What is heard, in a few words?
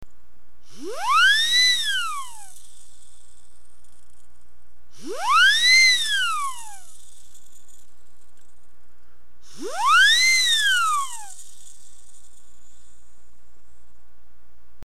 siren